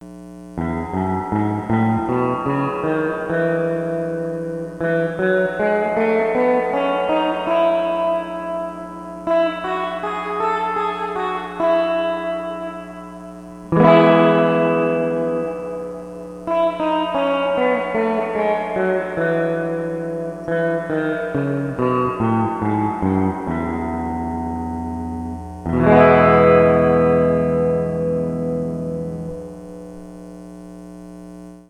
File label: Weber 5G15 Reverb Max E Major, guitars; Pedal Effects